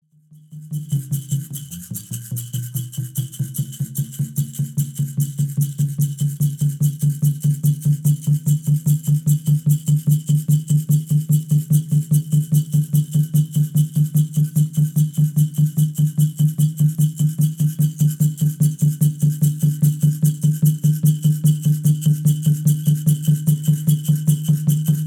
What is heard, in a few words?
Peyote Song